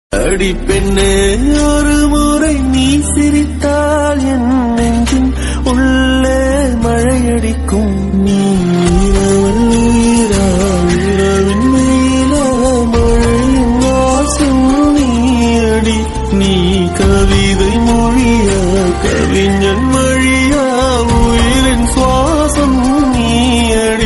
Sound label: duet song ringtone